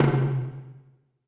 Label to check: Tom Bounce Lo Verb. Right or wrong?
Right